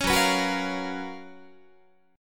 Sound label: Gb Major 11th Guitar; GbM11 strummed